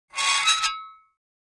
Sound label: Metal Scrape On Metal